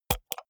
computer mouse wheel click hard

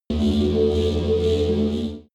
extrinsic alarm

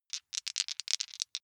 big two rattle in hand short

